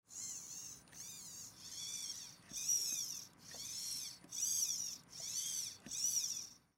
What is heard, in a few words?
Sci Fi Servo Motor Small Robot; Up Down; Blastwave